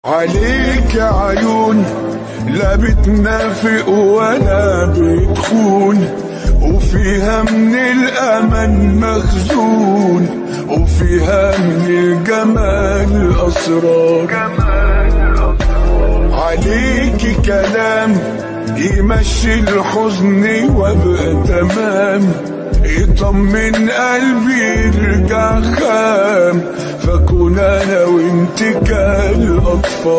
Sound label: Arabic Song Ringtone